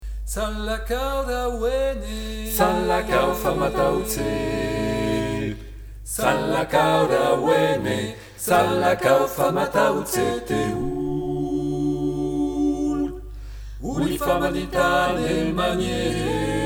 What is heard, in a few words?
Salakao tutti